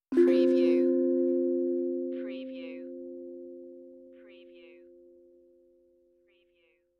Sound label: Tongue Drum Meditative Tone; beanstalk audio